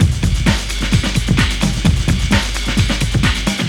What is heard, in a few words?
Right Break, Breaks, Jungle; Blu Mar Ten - Jungle Jungle - 1989 to 1999 Samplepack